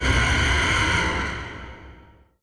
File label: Spider Scream; Mimic